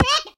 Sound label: flamingo die; Clicker Heroes